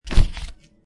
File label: human impact on ground; meat madness redux; godot